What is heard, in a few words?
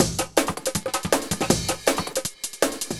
rumble 160